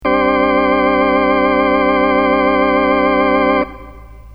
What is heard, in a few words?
organ, scanner vibrato